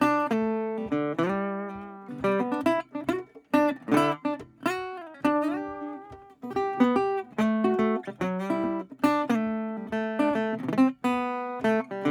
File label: bumper guitar